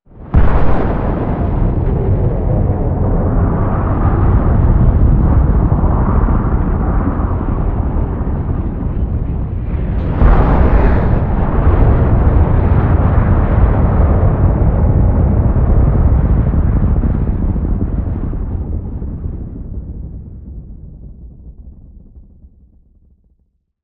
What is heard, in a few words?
missile launch; Modern Warfare